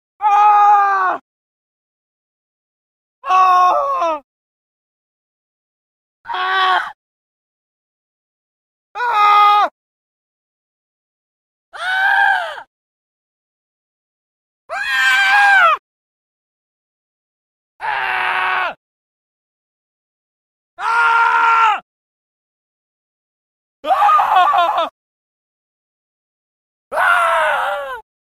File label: Death Screams 10 Male Scream; Historical; Hollywood Edge Historical Series; War Horses Armies & Weapons